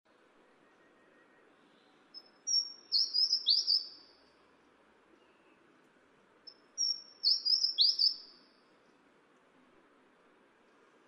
台灣鷦眉; 鱗胸鷦鷯、小鷦眉; 畫眉科/留鳥

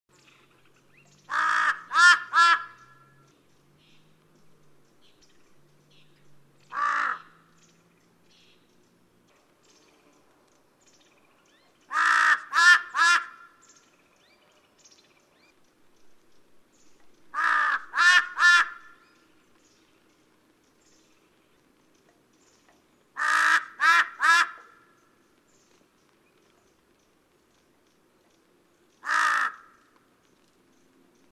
巨嘴鴉; 烏鴉; 鴉科/留鳥

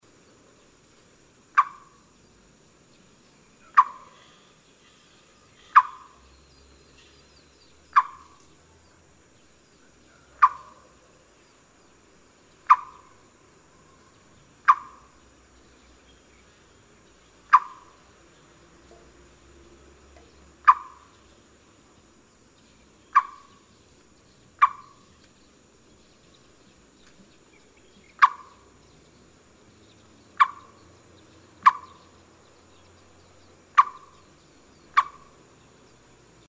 五色鳥; 灣擬啄木; 鬚鴷科/留鳥

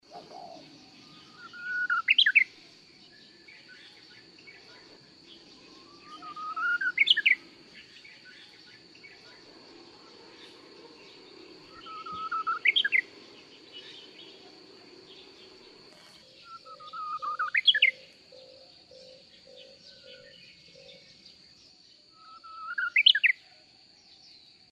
遠東樹鶯; 樹鶯科/冬候鳥

